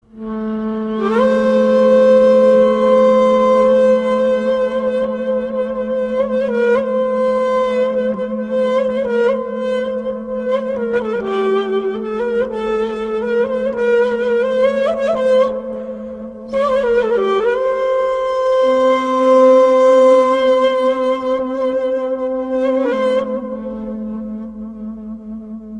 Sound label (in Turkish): Ney dinletisi